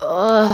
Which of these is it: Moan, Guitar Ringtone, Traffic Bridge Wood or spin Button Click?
Moan